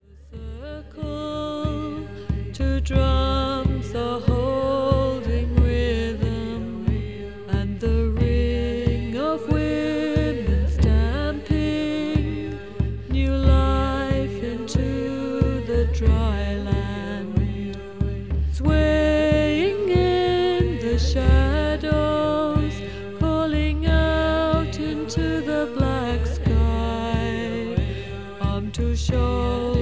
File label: Two Drumbeats